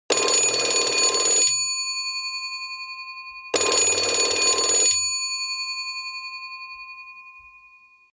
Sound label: Old ring, ringtones